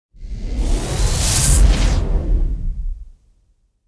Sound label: whoosh power; NCH Library